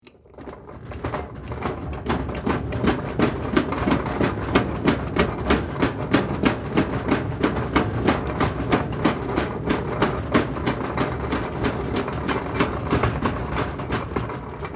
Tool Chest Roll, Industry, Metal, Tool, Chest, Roll, Push, Long; Hand Tools